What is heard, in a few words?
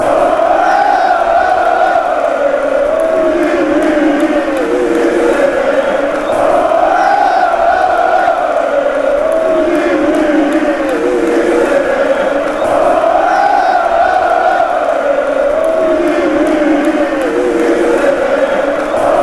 chant, fanchants; panathinaikos